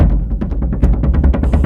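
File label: Music Shield; from dust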